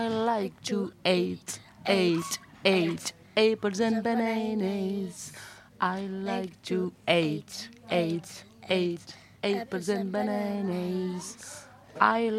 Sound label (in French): comptine anglaise